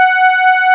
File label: PD DARK, Nord, LEAD PAD HRN; Wizoo - Powered Nord